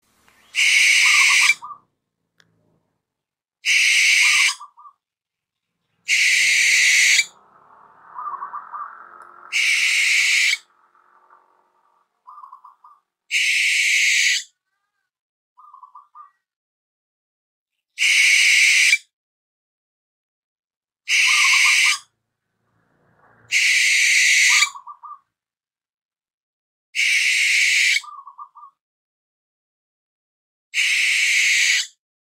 suara burung hantu jawa

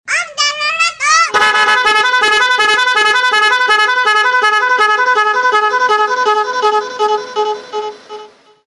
nada dering om telolet om

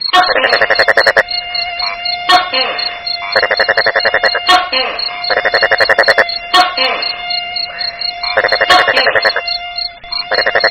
nada dering alarm tokek kodok nyamuk

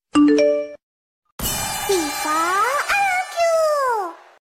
nada notifikasi Wa viral tiktok; viva rrq

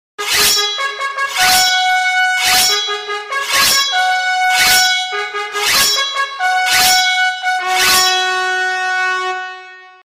nada dering gojek gacor terbaru; bawaan TikTok

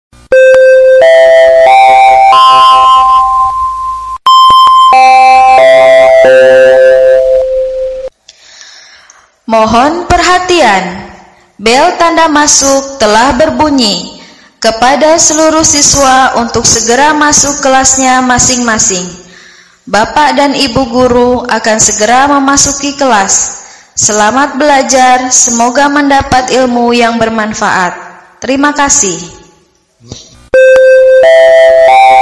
bel masuk sekolah

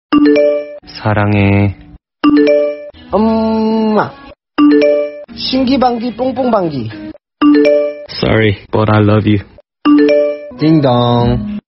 nada notifikasi wa

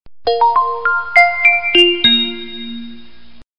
nada dering blackberry gemini; notifikasi Blackberry Gemini original

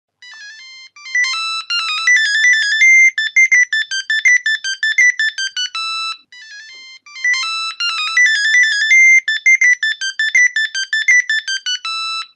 nada dering monophonic siemens jadul; ringtone Siemens C45 retro

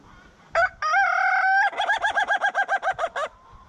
nada dering ayam ketawa

